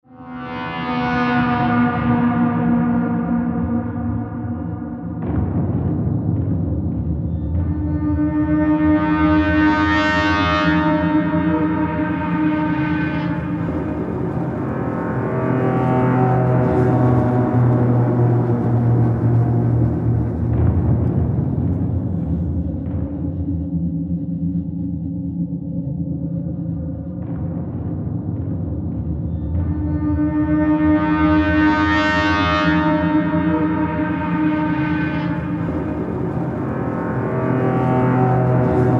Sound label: Darkness Metallic Bender